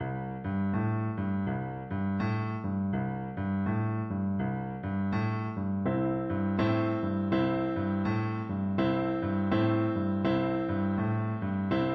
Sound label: jingle bells boogie FL kar, Flute